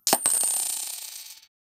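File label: aged wooden chest creaking; treasure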